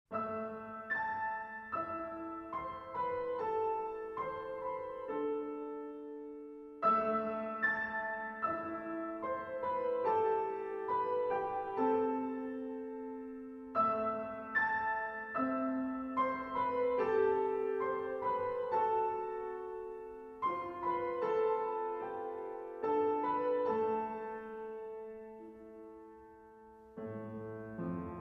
Peaceful Waters; Keizaal: Journey to Skyrim

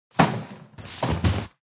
Hands out loop

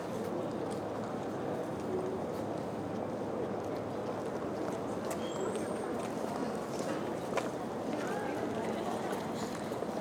Street; Tokimeki Idol

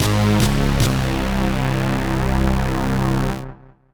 Player Dead Music; Grave Chase

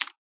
Keypress

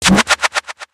Boss Attack

